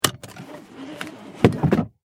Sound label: transportation car seat belt unlock; Car Seat Belt Unlocked With Belt Being Wound In By Retractor Mechanism; Vehicles; McKinney Sound